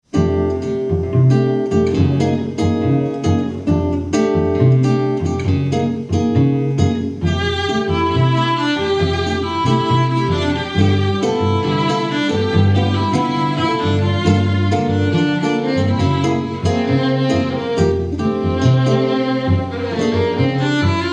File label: String trio